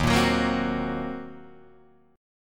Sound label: Eb Minor 6th, Guitar DADGAD; strummed